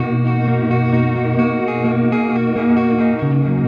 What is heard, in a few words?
Tapeloopgtr, Guitartronics; Sonic Foundry (Sony Creative Software); Ma Ja Le Ethereal Textures